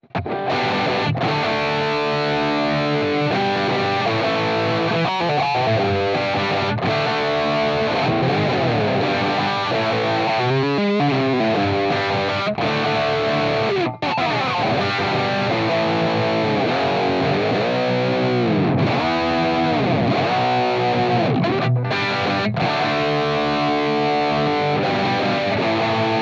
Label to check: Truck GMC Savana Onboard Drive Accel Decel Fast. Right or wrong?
Wrong